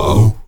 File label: AHOO A 2A, Heart of Africa, STAC.OO-V; Spectrasonics